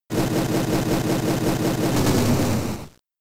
Fire Blast; Pokemon; Gen 3; attack moves; RSE

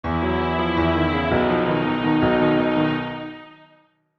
Unlocked!; Citydom

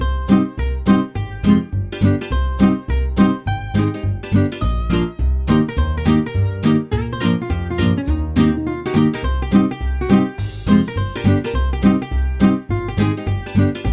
Retro; American McGee Presents Bad Day LA

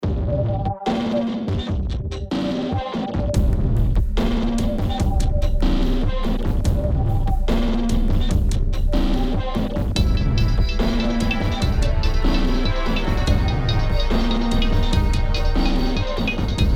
atmo; American McGee's Scrapland

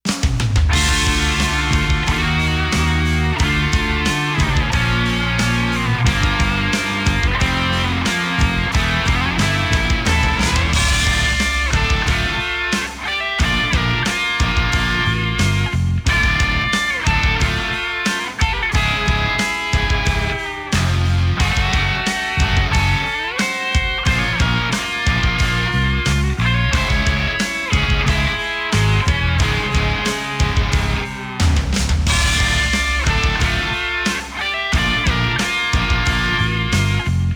Match Loss; Against - Bowser

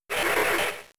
Growl; Pokemon; Gen 3; attack moves; RSE

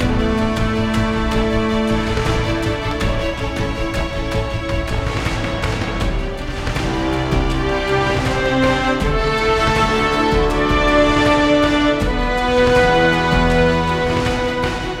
Mood High

